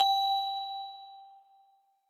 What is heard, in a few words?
bell